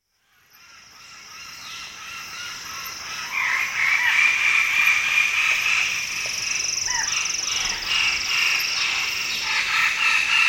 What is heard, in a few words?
forest wallace's; bbc